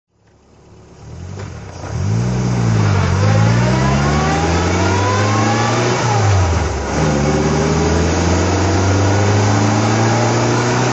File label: mg midget drive